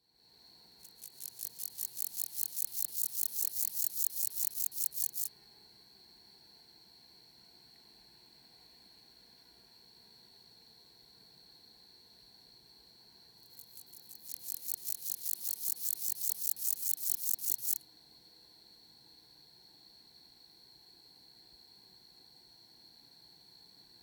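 marsh meadow grasshopper, Northern Illinois Insect Calls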